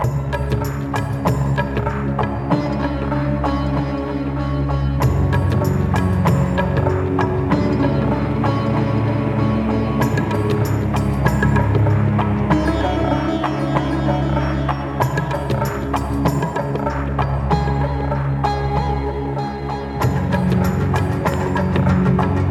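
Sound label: Catacombs Ambient, game music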